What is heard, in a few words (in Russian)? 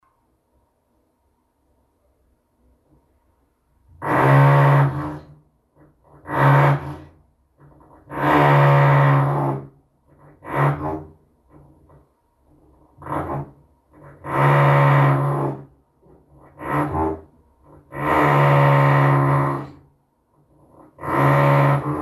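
persistent drilling behind the wall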